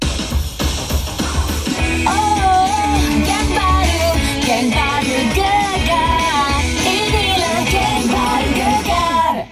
Jingle; Geng Baru Gegar

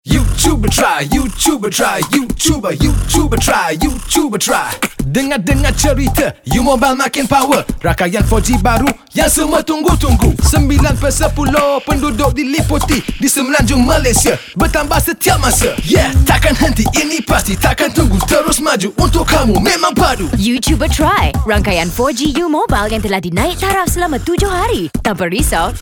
BM Rap; U Mobile

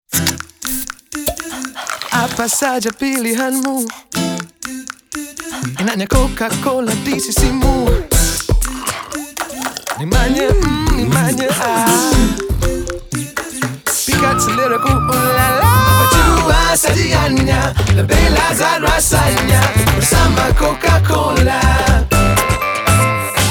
BM JINGLE; Coke

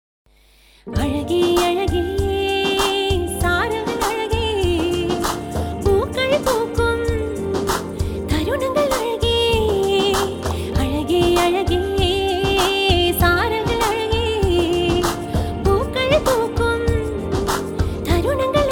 Tamil song

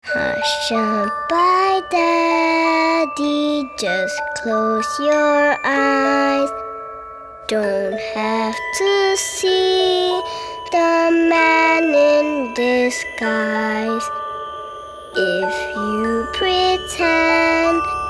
Nursery Rhyme

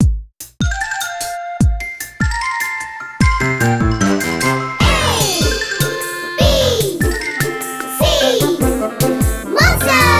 Theme Song

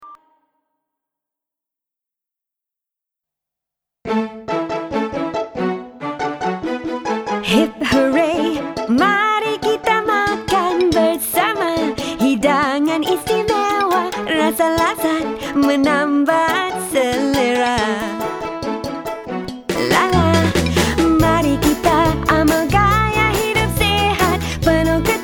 JINGLE BM; Ajinomoto

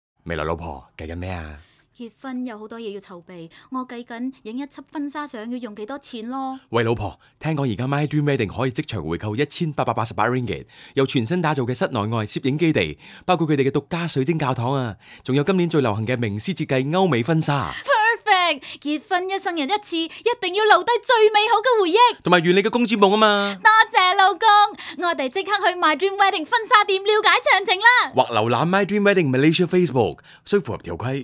Jingle

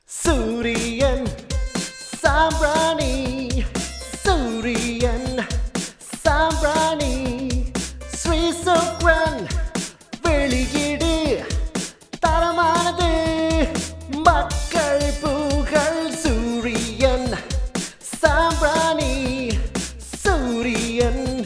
jingle; surian

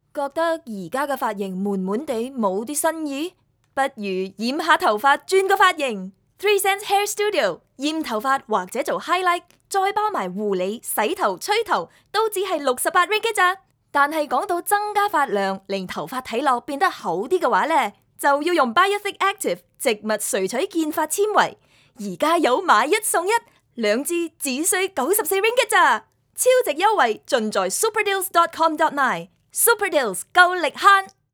Jingle

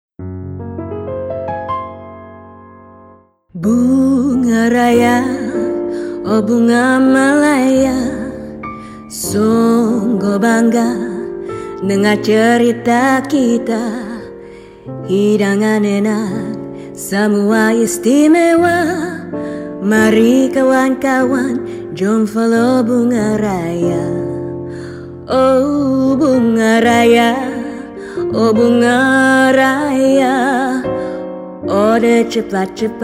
Jingle; Bunga Raya

